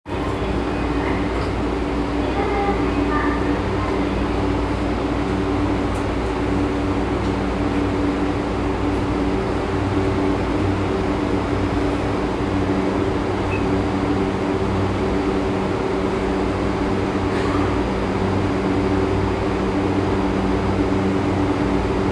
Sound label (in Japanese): 東急田園都市線三軒茶屋北, train sounds